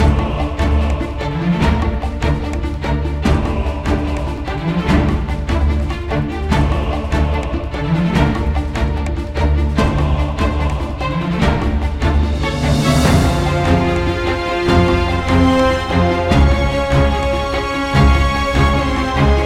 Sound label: Game Music fight; Tsinghua